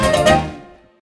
mus cage forcefield; jungle world

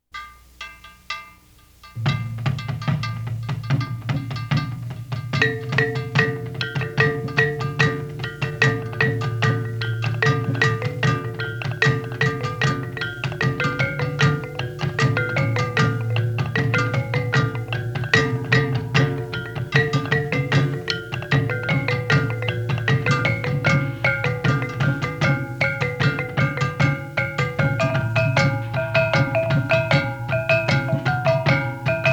Tagunggo' Rhythm

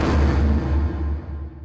Mus Tsunami 145 Light; from dust

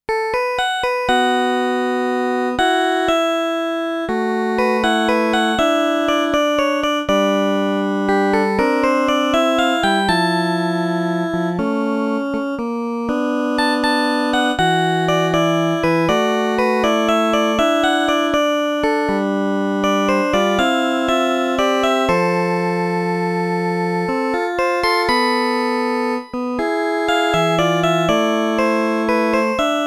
Ringtone; Gyakuten Saiban Sound Box; Godot

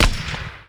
Weapon fire single minigun

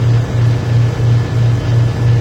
Vending Idle loop; criminality

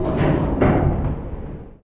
Elevator end

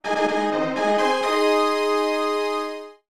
Victory in a Naval Battle; Dai Koukai Jidai 2; Uncharted Waters New Horizons; SNES

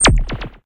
Hit

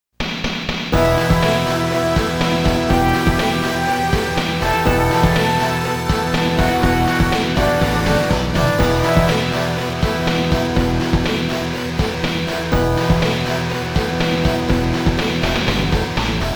BGM - Ground Attack; yu suzuki produce g loc r360 virtua racing

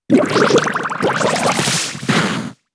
Bubble; pokemon sfx gen 7 attack moves sumo usum

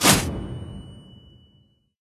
Hero Flash; Tramin

